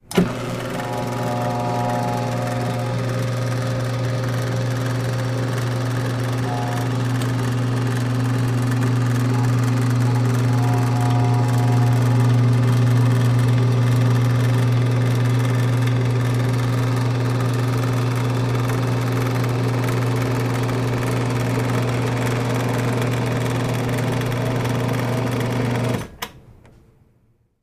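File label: Dentist Chair Steady; seats & stools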